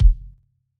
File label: Kick Massive Stereo